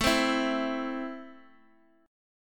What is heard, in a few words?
A Minor A down Guitar Open G